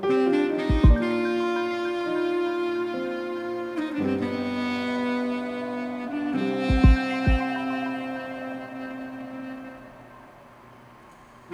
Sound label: Chill Sax; Solos